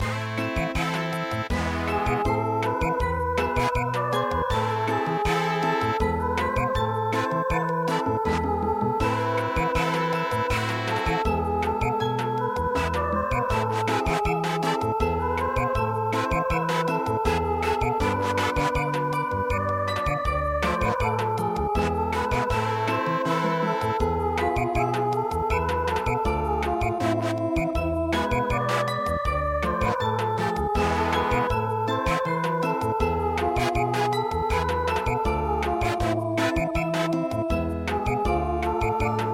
Samba, midi

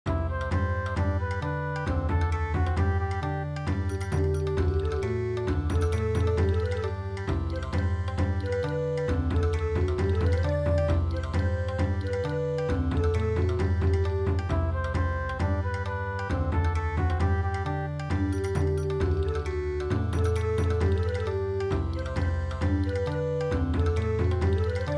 swing Bon Odori

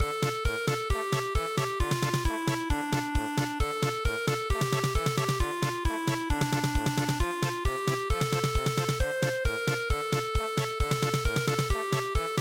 polka; Camp Night

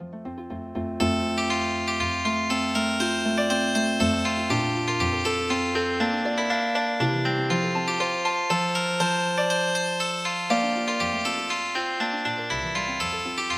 Healing Harp

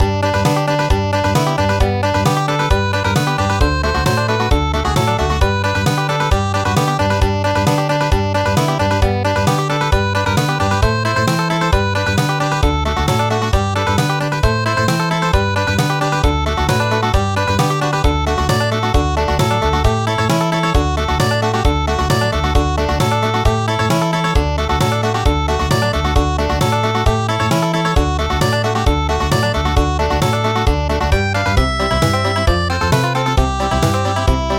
Country

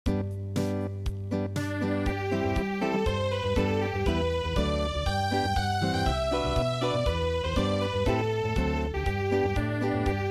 Acoustic

